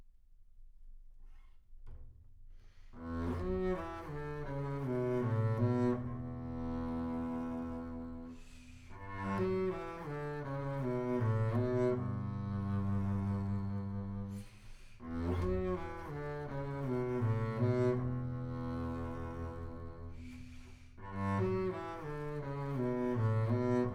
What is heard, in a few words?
Bass Room